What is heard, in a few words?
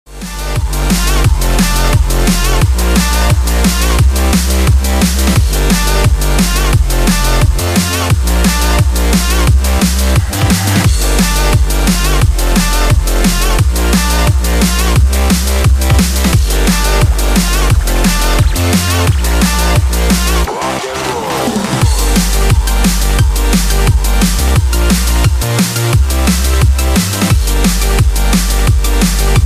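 stage diver drum and bass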